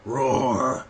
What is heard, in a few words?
roar vap0r